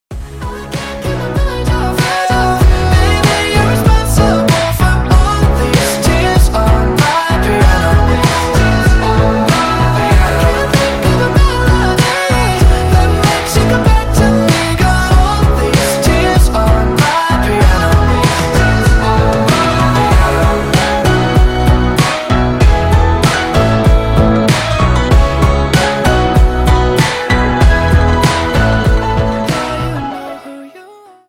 Piano

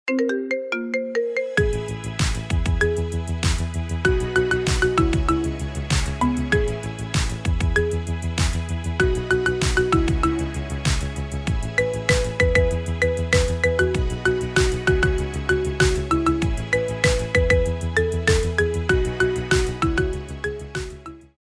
Marimba Remix Ringtone Remix; iRingtones

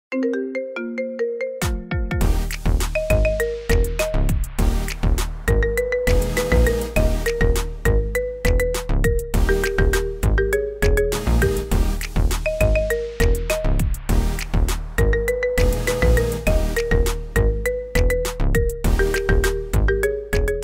Birthday Song Marimba Remix

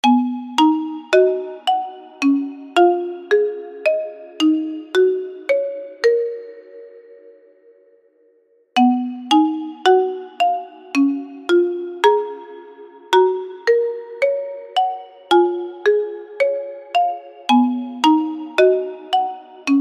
Marimba; Naruto

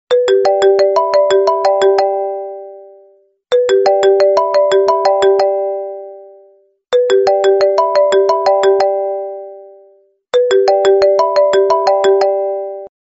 Iphone Da Apple

